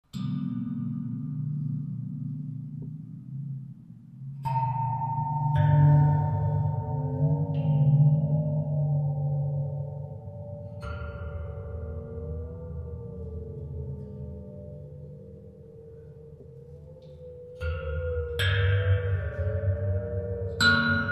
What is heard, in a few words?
waterphone finger